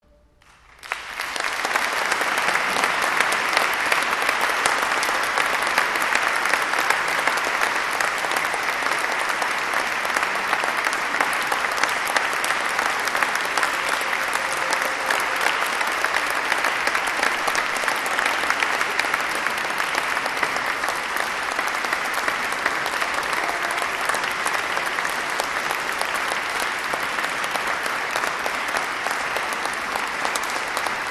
APPLAUS Chor und Jubilaeumschor

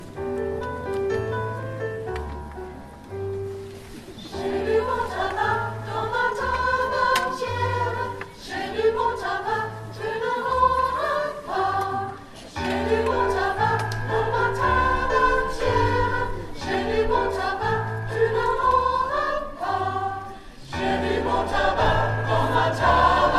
Chor und Jubilaeumschor Traditional; Lengfeld; Konzertmitschnitt vom 2. Juli 2024; Jai du bon tabac

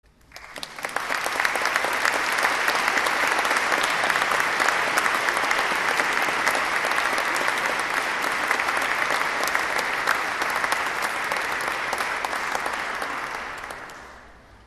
APPLAUS; Winterlied; Michael Praeterius

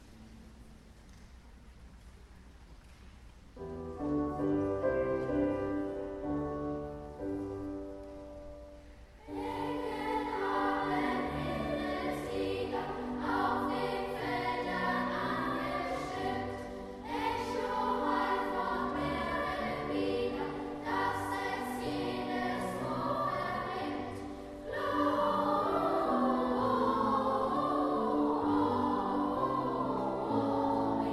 Unterstufenchor Engel haben Himmelslieder Traditional

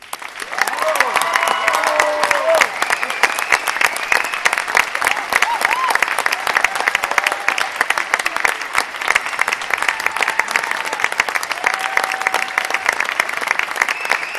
Schlussapplaus

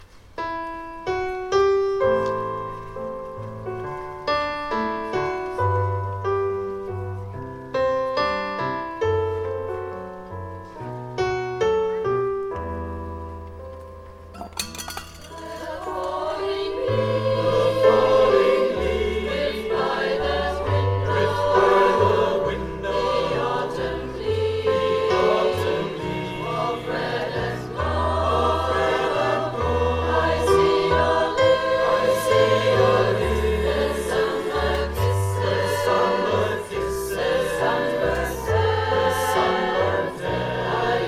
Chor und Jubilaeumschor

